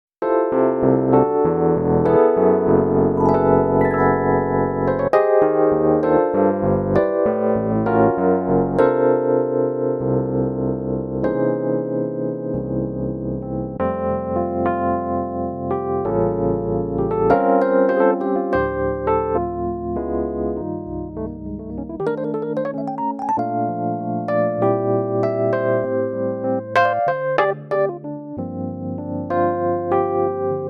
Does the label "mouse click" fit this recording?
No